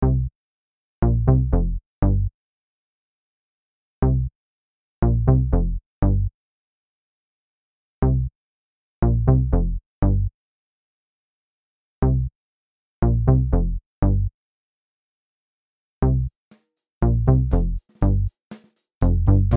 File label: Bass Around (+drums)